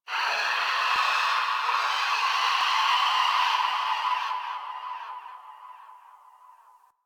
Far Distant Creatures, Ambience; Warframe; Venus Open World; Venus Landscape; One Offs

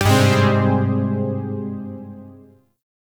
Shawzin Phrygian Chord K; Zariman Shawzin

